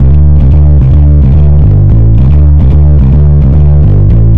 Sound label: Distorted D, Bass; Effected; Bass X